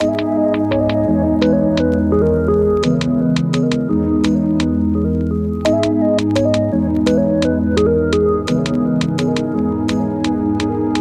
LOFI BEAT

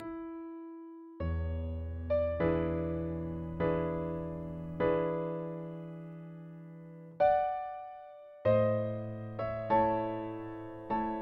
Prelude; Chopin

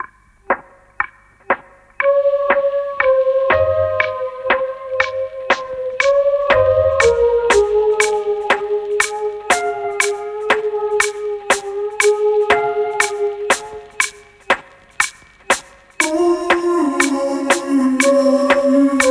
Atmosphere Delicate lo-fi music

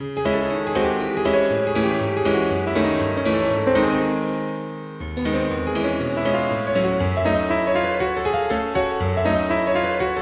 Free Classical Music Impromptu; Piotr Ilitch Tchaikovski

